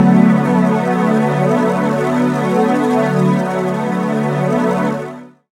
Wash Pad; Zero-G Jungle Warfare 1, 2 & 3; 90 s Sample CDs

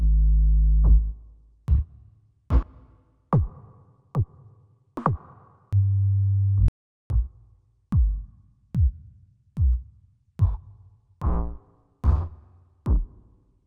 Yamaha Tenori-On Stomp kit

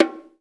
HI BONG, ETHNIC PERC; Roland - LA Composer vol. 1

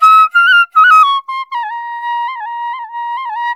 INDIA PICC

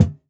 Kick, jungle breaks drum sounds

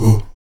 VOX AHOO, Afro Chants, Stacc Ah Oos

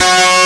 Crowd; SNES; Captain Tsubasa 5

